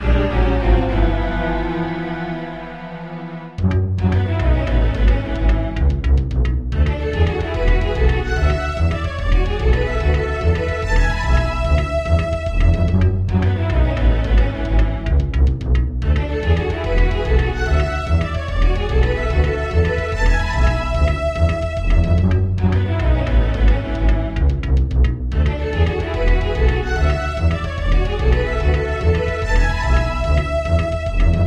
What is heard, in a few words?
Orchestral; Sim City SNES